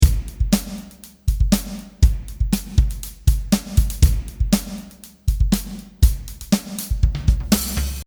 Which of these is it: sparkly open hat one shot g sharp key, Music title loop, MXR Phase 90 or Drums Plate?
Drums Plate